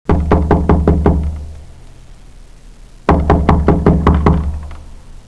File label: KNOCK